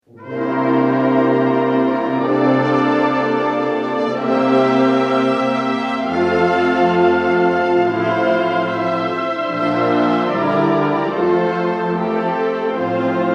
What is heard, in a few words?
Irish Tune Ensemble